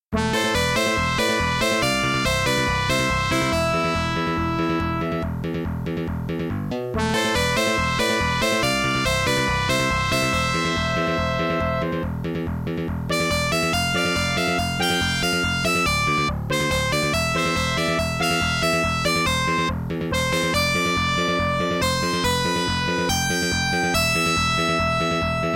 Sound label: Building Interior; Star Cruiser; Sound of Arsys